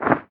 Ground short

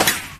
Bullet impact

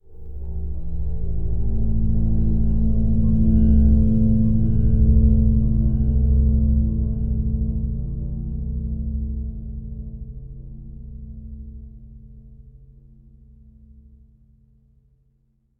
sting Discovery

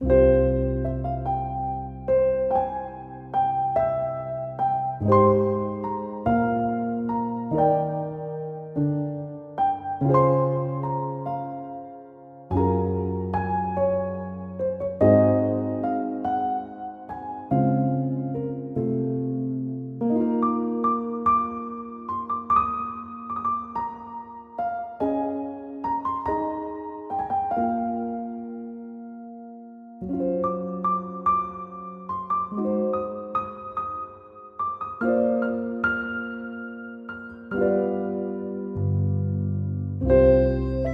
theme oneshot 72bpm; wielderspast

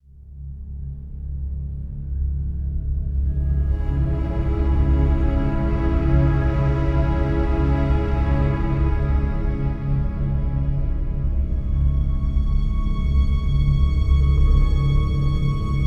Clar Backing; Title Cards Ep05

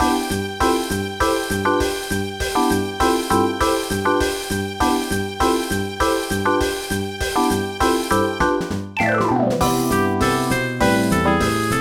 Jazz Stage; pinky street kira kira music night pop town gamerip

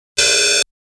Ring Laser Attack Sound; Saint Dragon

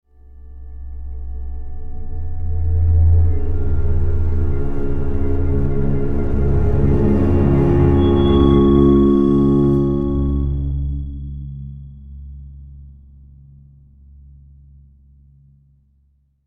Death Stinger

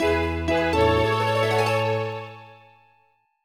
Fanfare Repair; nintendo ds; Disney Fairies Tinker Bell

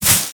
run grass stop

